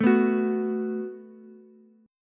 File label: F sharp Minor Double Flat 5th, Ukulele; strummed; x,9,7,9; down